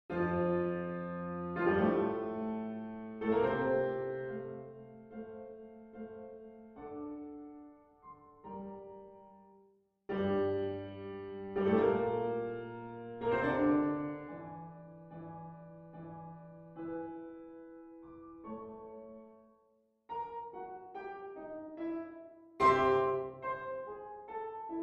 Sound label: Concertino w PIANO